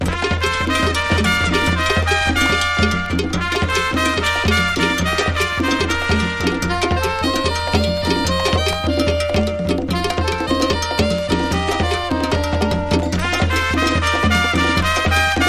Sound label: super latin percussion; LATIN JAZZ